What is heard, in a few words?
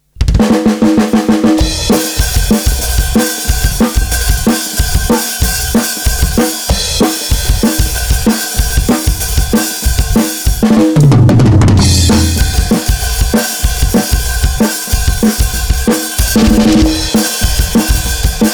Drum Sample 8 Beat